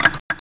SWITCH

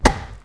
BATTING

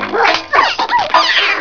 GREMLINS, ANIMALS

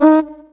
FUNHORN; BELLHORN

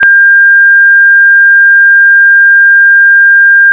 BIP censure

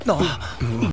hohh

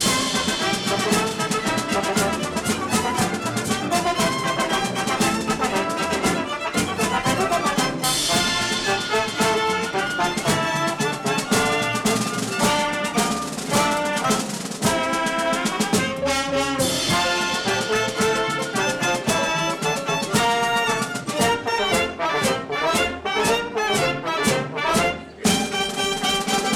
FANFARE, CARTOON

